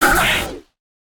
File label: hit; toxifin